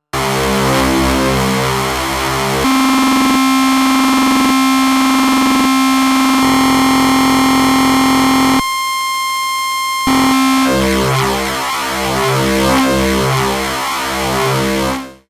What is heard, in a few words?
Waverazor Dual Oscillator Multi Sync; MOK